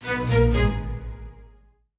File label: loading failed